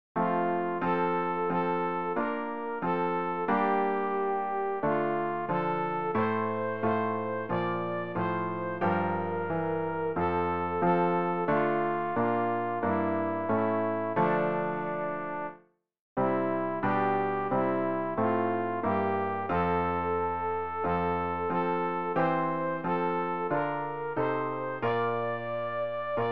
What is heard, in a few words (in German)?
sopran; Ref. Gesangbuch; RG 597: Herr, der du mir das Leben